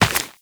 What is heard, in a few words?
Run Dirt, Footsteps; Warframe; Tenno